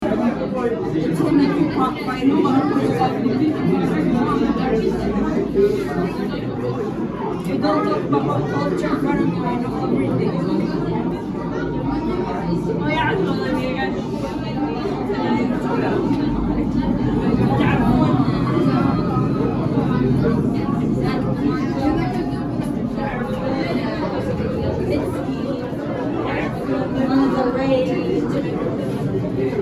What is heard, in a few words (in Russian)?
zvuk liudey v ocheredi